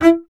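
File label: string contrabass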